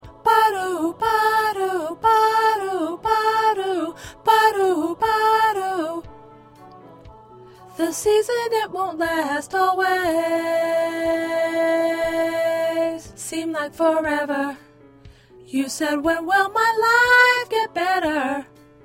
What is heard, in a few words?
2nd Alto